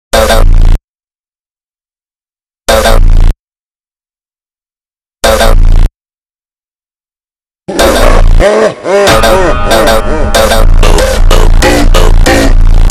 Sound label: Hardstyle super Slowed, funk